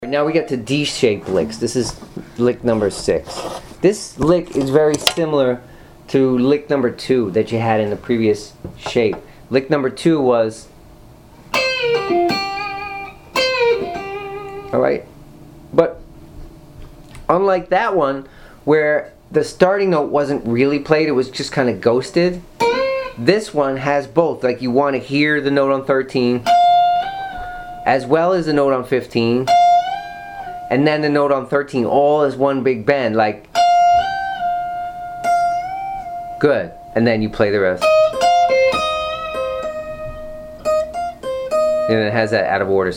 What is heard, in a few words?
string bend lick